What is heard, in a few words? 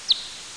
whiskered, bats